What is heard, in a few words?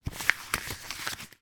paper ticket screw up; foley